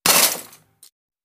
Glass Impacts Debris; windows & mirrors

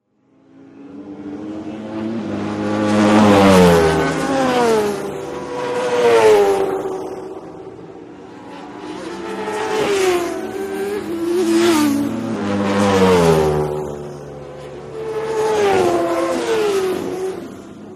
Motorcycle Road Racing

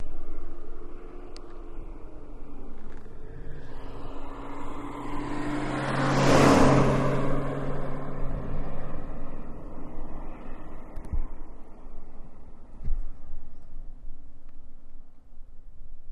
Mustang Med By Highwa

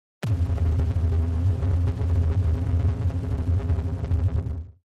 Distortion Muffled Distorted Drone

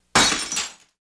Dish Falls Breaks

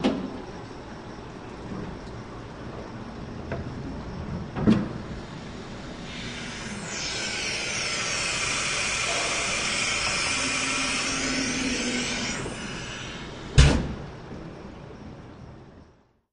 Mill Machine Clunk, hydraulic

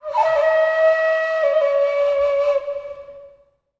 Columbian Flute

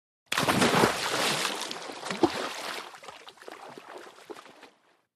Splash Large

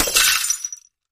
Picture Frame Crash; housewares

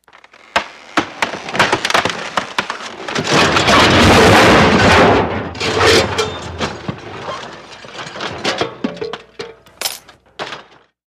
House Collaps Crashi, crashes & creaks

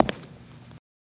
FS Male On Hardwood, singles wood

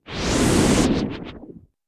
Space Ship Slowing; Space Ship Slowing Down with Warble ( Low-pitch ); zaps

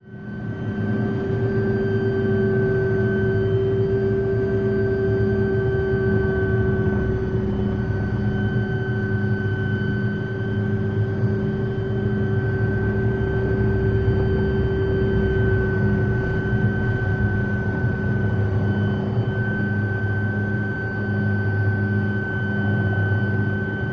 OH6 Loach Interior, little bird

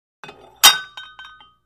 Free Weights Exerci

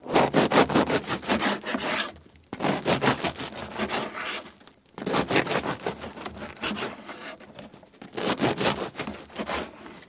Cut Slice Loop Bread, utensils

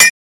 Bullet Shell Copper, shell casings fall; Bullet Shell On Copper Tubing Quick

